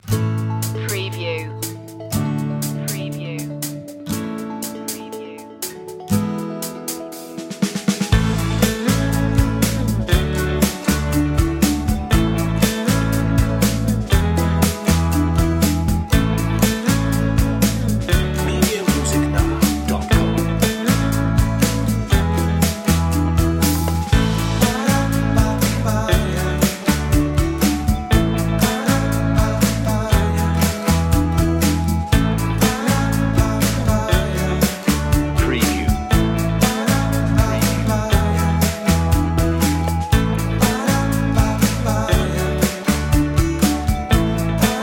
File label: feel good